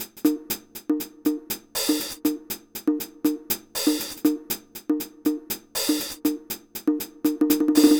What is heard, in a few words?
120 Perc; Zero-G; Soundclash Nu Dance Electro; Combi Loops; 120bpm; Nu Rhythmix